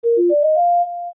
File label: Silver Rupee; Get a silver rupee, #1 to #4; zelda; OOT